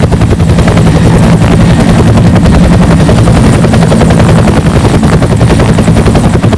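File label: helicopter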